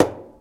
hit general; Timelock VR

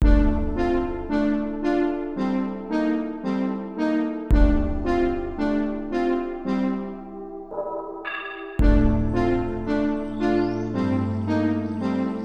Cave; The Legend of Heroes III White Witch; sc-88 pro; pc-98 gamerip 1994

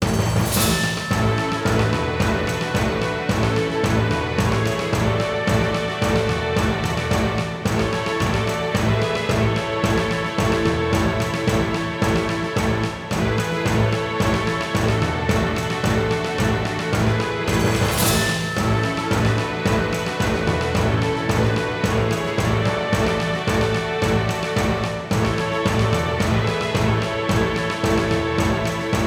Victory Quarter-Final Map

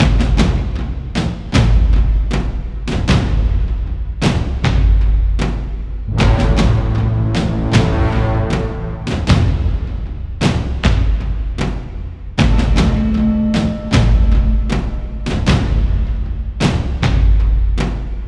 Drums; For Honor

